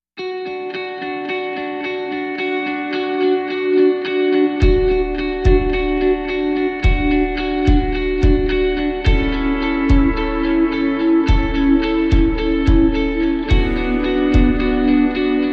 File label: No Vocals